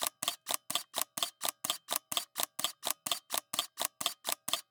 Camera, Pentax KS2, DSLR, electronic, mechanical, snapshots, rapid, smooth, loop, XY; Eiravaein Works; Vaeyan IV